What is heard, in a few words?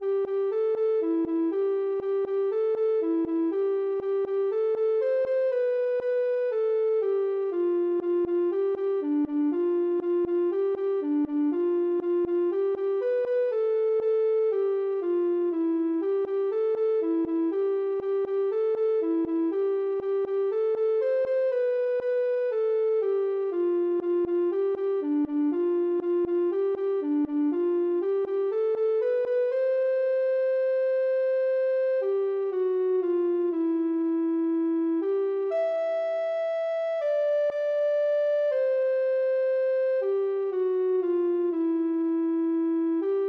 chipchip, ocarina